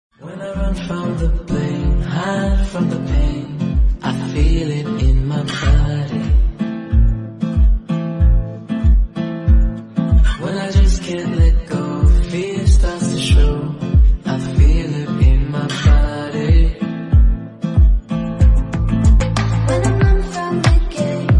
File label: English Song